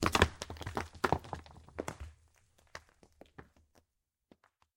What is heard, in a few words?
Rumble Rock One; Puddle Plus